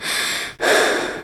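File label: Realistic Fatigue Voice, female; oblivion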